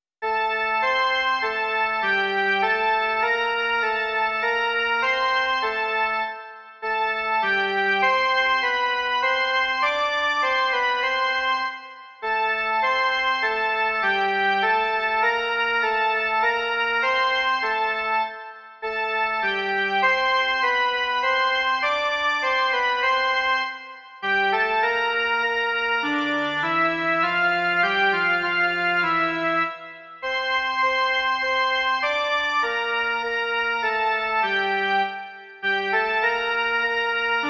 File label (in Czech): jen melodie; Ó vejdi, Bože